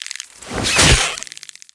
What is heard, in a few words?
Enemy Clicker Attack